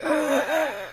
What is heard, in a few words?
female death gasp